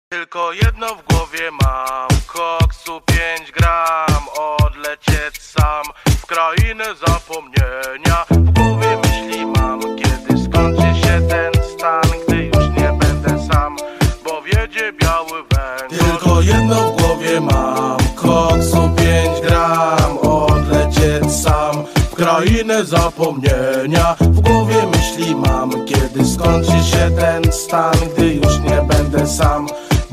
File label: polish cow meme song